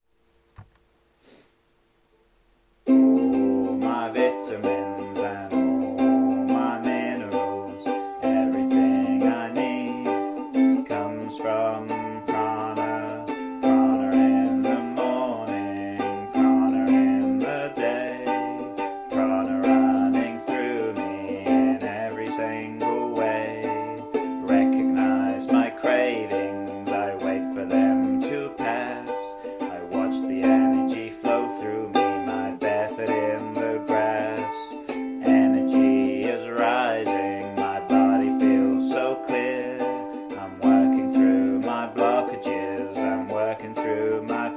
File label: Prana song